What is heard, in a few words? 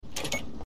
open door